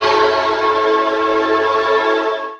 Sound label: crasher, Voice; Notes